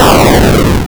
player die; minorminer

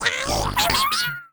die

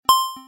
button over; simplechess

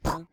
collide

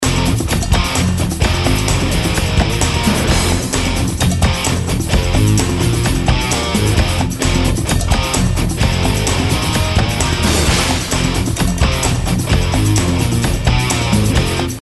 audio bg; charge

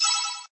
click; jump

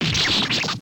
death; jokingyou

